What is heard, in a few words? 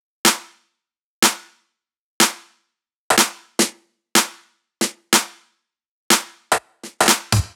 Snare loop, EDM 123 bpm; Future House; Drop; Ableton Projects; Big EDM 123 bpm (Drop) Project